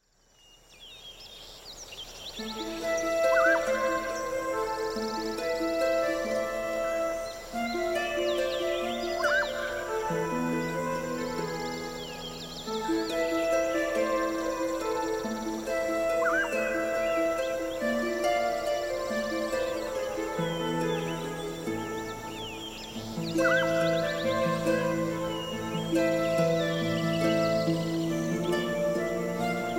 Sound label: Xiaomi Wood